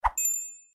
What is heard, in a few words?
character swap; Adventure Time - Elemental; ThrillGames